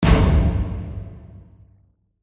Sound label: Hit